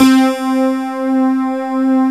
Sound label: D50 HARPSIST, DIGISYNTH; Best Service; Alpine Volksmusik